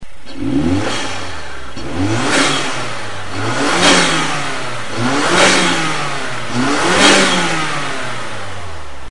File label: Mazda8 Turbo Intake sound; AutoExe